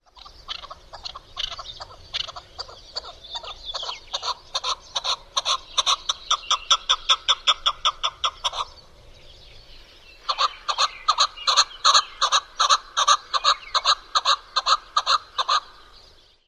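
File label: Chukar song and calls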